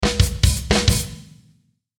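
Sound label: Musical Element, Drum Snare March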